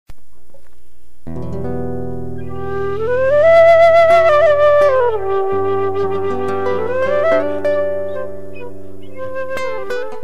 amorosissimo instrumental

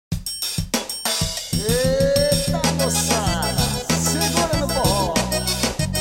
forró lotado

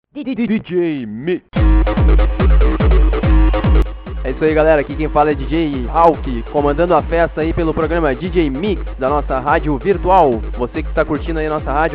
abertura

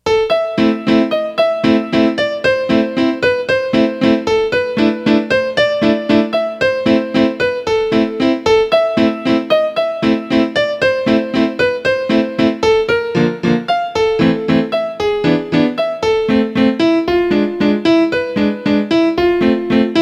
piano musical enfado

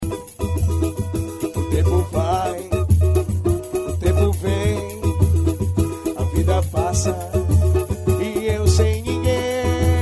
samba

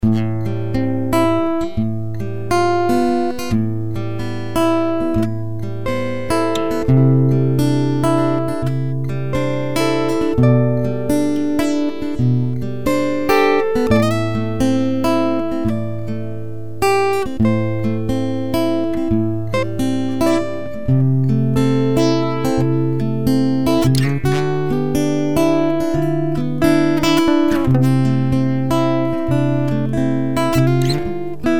delirio experimental instrumental

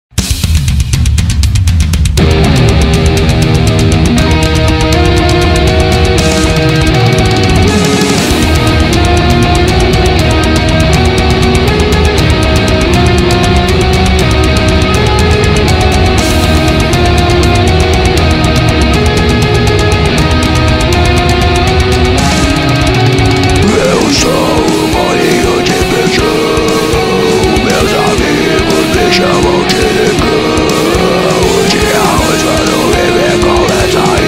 versao metal

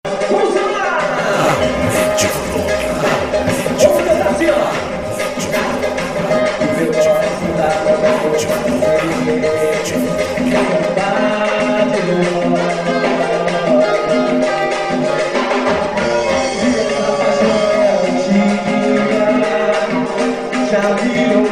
samba de roda

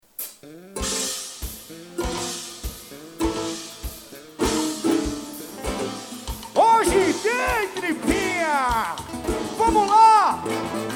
samba; belo jardim 2012

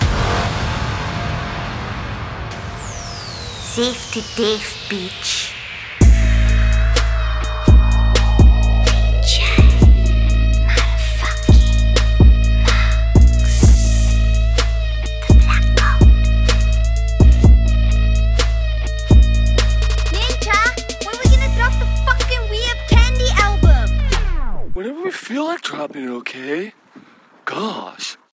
Quadrilha Rap

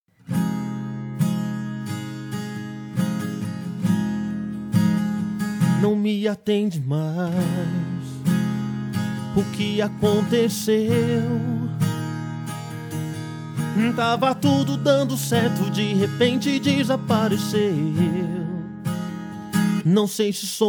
sertanejo

